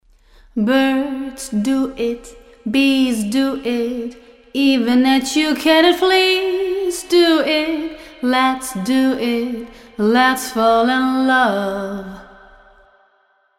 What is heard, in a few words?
Big Hall